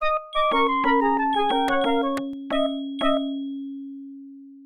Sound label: Failure; tokimeki memorial forever with you; ibm pc at